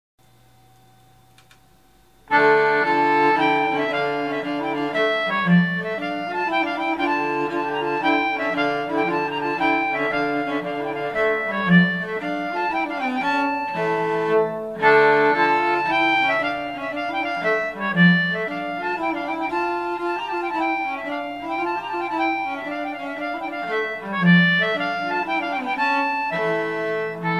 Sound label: Swedish, concertina